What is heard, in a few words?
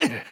damage; MonsterHunter; Fight